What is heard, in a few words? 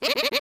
Chuckle